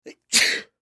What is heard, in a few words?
Human Male Sneeze; Sneezing